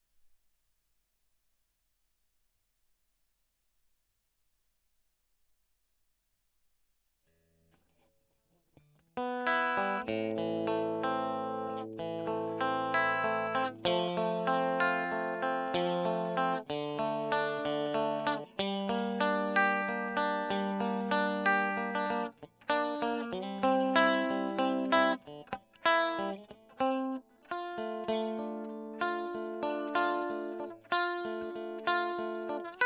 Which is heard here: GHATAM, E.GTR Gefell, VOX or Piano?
E.GTR Gefell